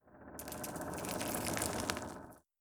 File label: ledge; Adam's Venture Origins; Kismet